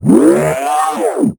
Robot; little robot sound factory

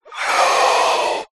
horror monster demon screech

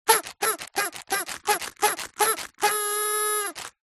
party horn blow paper tube roll out; zapsplat; foley

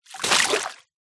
fishing net splash hit water fast

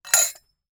silverware pot lid movement put on close; household

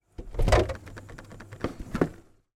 household refrigerator door open recorded inside

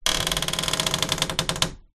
household door creak old house

